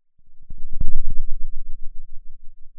pop, Sound of a thunderstorm

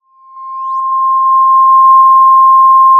Sound of a police car siren

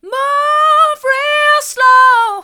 move real slow hard, vocal

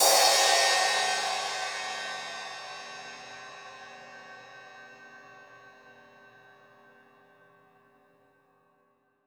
Multi Crash Hi, drum

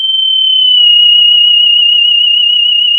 pop, sound of a tea kettle whistling

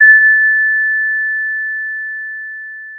pop, Sound of a church bell

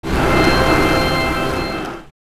snare based to noise

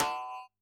Perc, drum

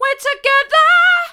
we're together hard, vocal

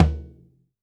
Tom, drum

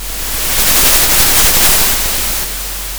Sound of a thunderstorm

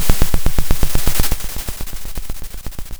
pop, Sound of a drum roll

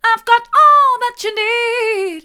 mid, vocal

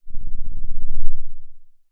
pop

